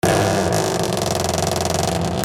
DOORS

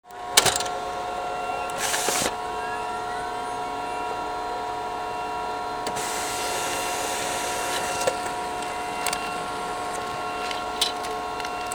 OFFICE

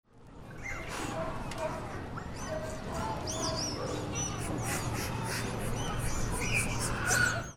ANIMALS

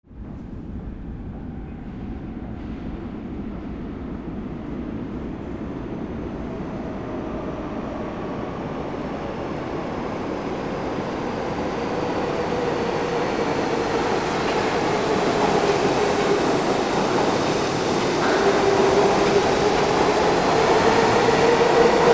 AMBIENCE

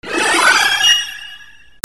SCIFI

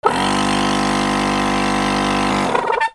INDUSTRY

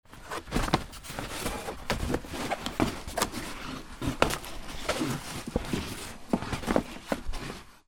FRICTION

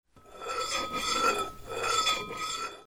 KITCHEN

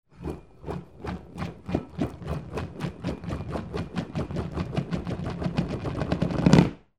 FALLING

